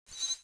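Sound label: Plunger Rusty, pinball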